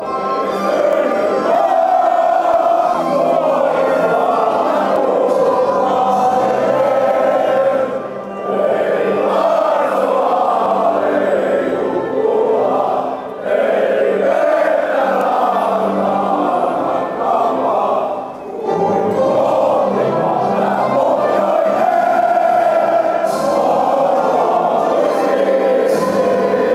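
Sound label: finland national anthem singing, fanchants; our land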